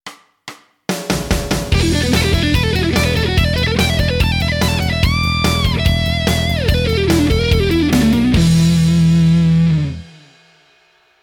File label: One Minute Lick fast, Guitar; Guitar Techniques 2019 Issue 290 January